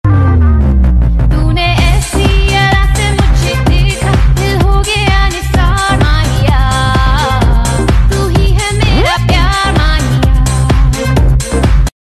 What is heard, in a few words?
bollywood ringtone